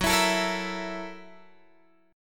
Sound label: F sharp 7th Sharp 9th, Guitar, Open G